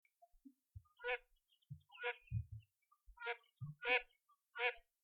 anas capensis female, birds calls; anatidae

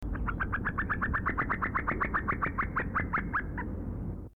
cuculus gularis female, birds calls; cuculidae

